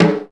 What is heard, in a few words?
Feather Drum; SNES; Tsuppari Oozumo Rissin Shusse Hen